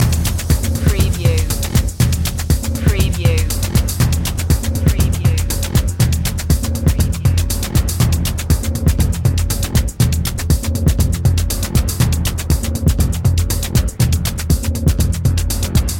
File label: voodoo dance loop